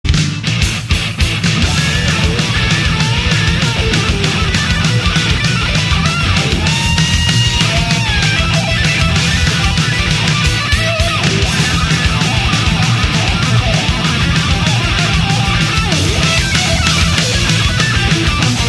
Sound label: solo